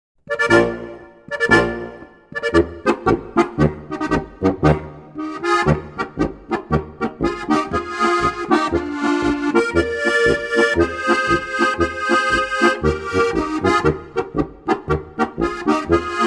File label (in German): polka